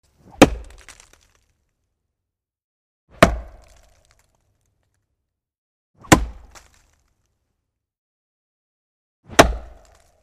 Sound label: Throwing a battle ax, flying by, hitting a tree; cold weapons